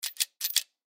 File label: Coin Dispenser; Coin Dispenser Nickel; Money Sound Effects; Objects; Foley